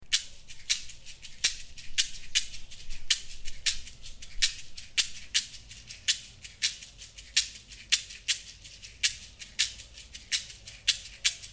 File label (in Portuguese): Caxixi